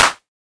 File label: clap; hyperfocus dsp